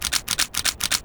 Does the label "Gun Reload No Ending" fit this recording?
Yes